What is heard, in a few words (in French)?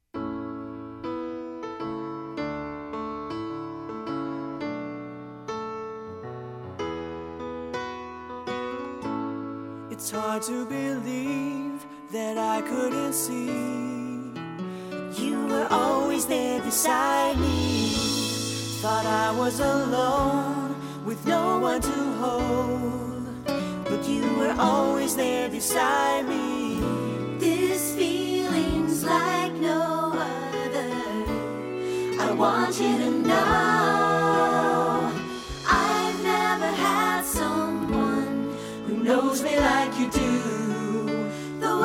vocal